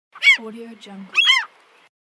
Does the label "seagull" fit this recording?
Yes